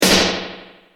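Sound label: snare ad202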